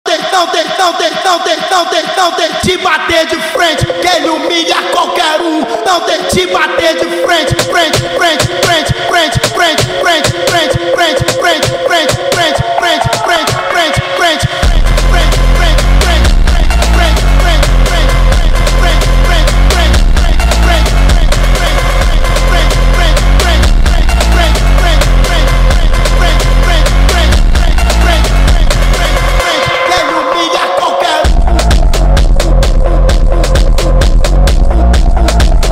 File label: Brazilian Phonk remix